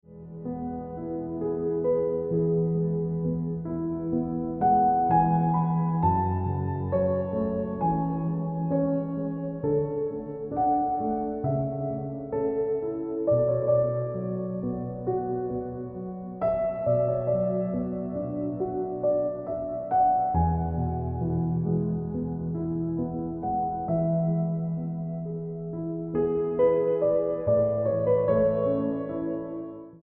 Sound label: Ringtone Piano Music